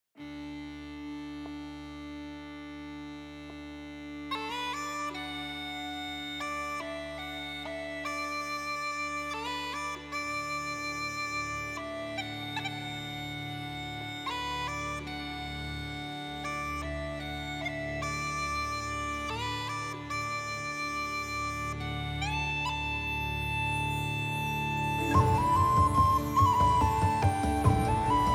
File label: Jesus Saves Accompaniment; worship ministry; weekly music